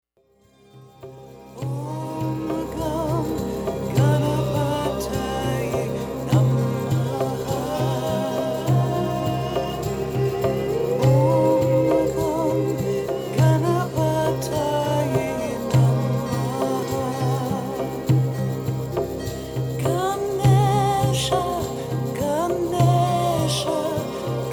Chakra Healing Chants

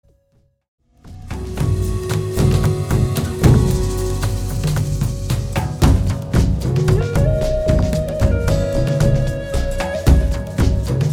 Drum

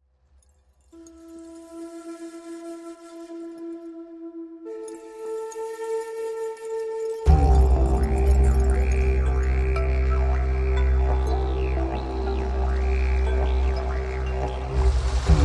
Didge Beach

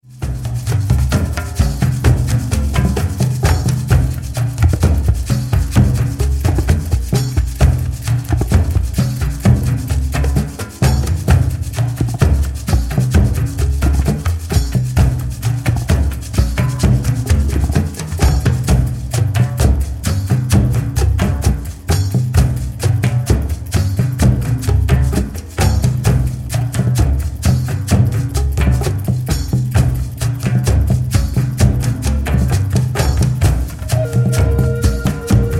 Thunder Drum

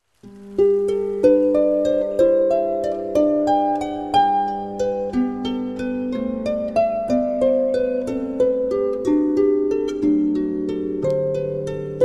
Magical Harp

